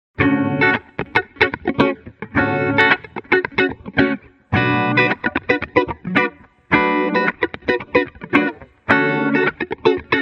Phaser, Spinning Speaker, Guitar Wet; Chromacycle; Syntheway

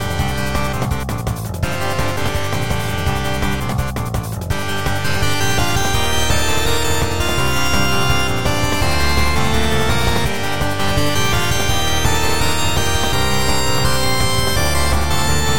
Hard Overdrive Organ; Harmodion; Syntheway

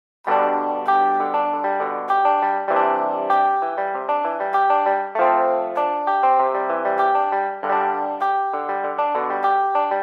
Overdrive Wet; Overdriverb; syntheway

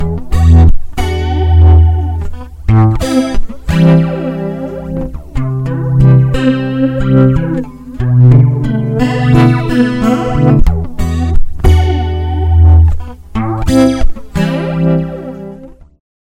Stereo Flanger Classic Flanger Guitar Wet; Sonicircuit; Syntheway

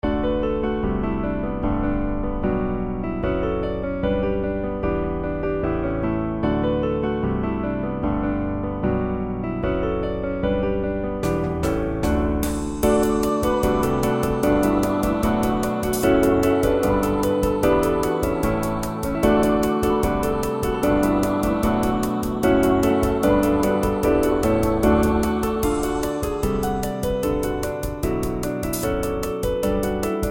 Piano; Syntheway; Realistic Virtual Piano

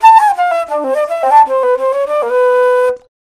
Spatial Reverb Flute Dry; Virtual Room Reveb; Syntheway

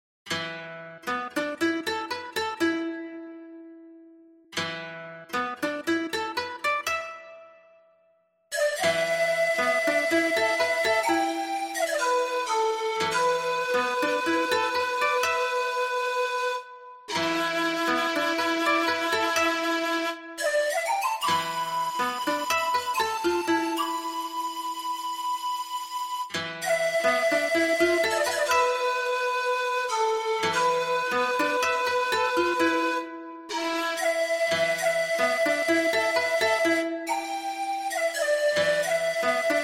Koto Shakuhachi Siku; ShamiKoto; Syntheway

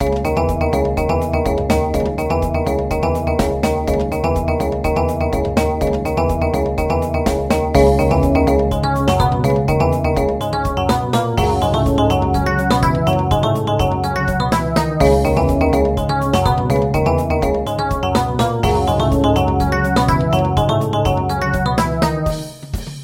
Korg 01W DynoPiano; ElectriKeys; Syntheway

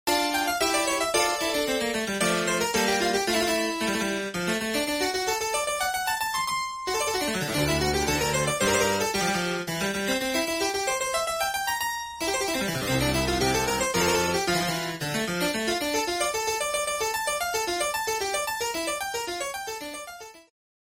Harpsichord; Suite in D major; Les Cyclopes; Rondeau; Jean-Philippe Rameau; RetroMagix Harpsichord

